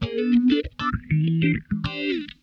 SOFT FAZE, Funk Guitar; 90 s Sample CDs; Zero G

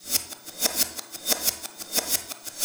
Cymbol Particle; Particles

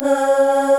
OOO F#3; East West; Global Sounds

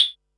Perc, Percussion and FX; Pearl SC-40

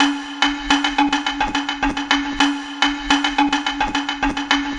Mighty Klong, Acoustic Beats; Sonic Foundry (Sony Creative Software); Myths of Technology

